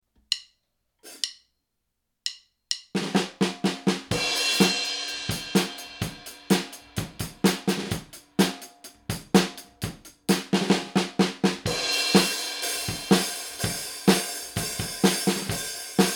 drums F2, mic tests

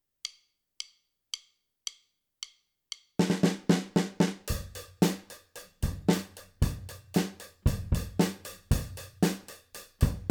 hat Snare Bass raw, mahogany drum kit

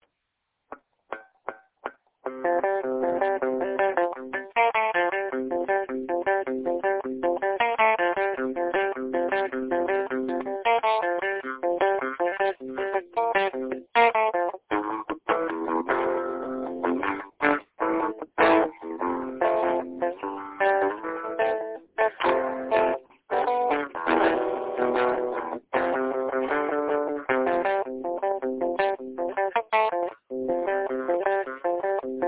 some twang but not country

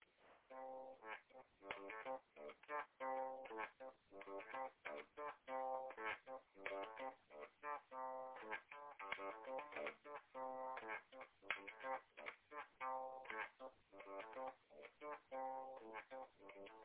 funky d bassline

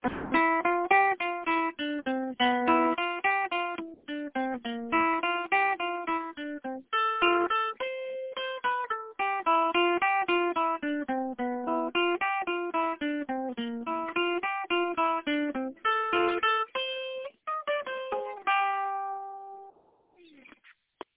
outline for a rock ballad

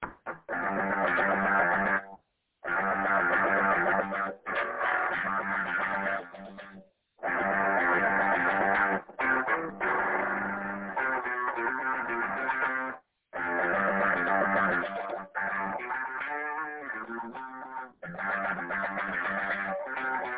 metal blues

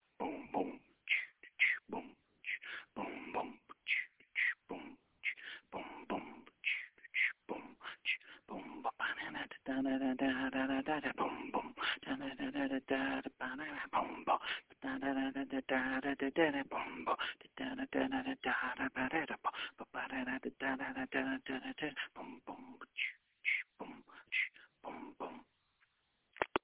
little beatbox

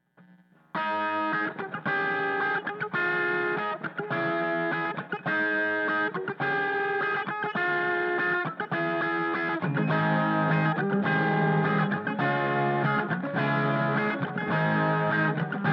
guitar Bus

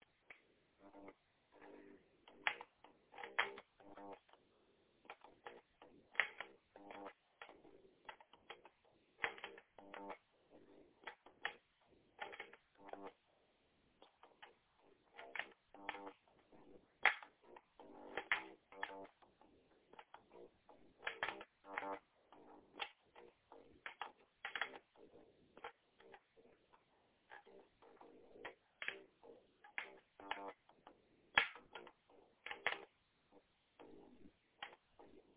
hardly audible bass groove

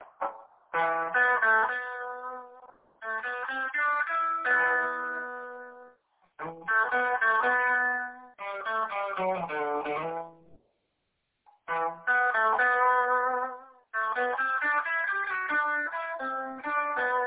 metal recitative

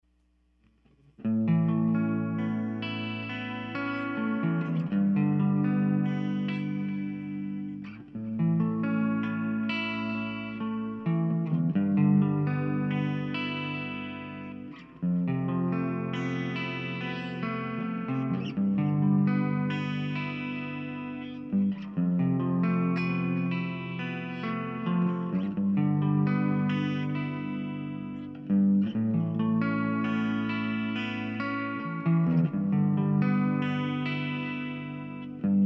pad Gtr SM57, mic tests

